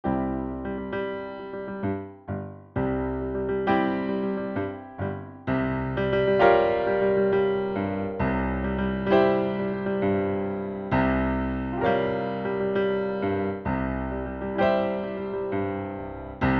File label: Piano; Copa; Singing Audition Pieces; Rico